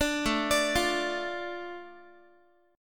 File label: A Suspended 4th Sharp 5th down Guitar; Asus4#5; arpeggiated; 17,17,17,15,x,x